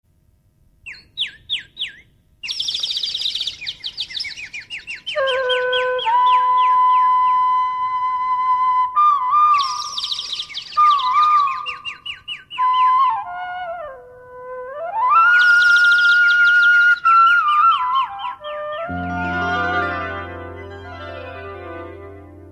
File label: Birds And Flute